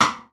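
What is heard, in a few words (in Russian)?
стук теннисного мячика по столу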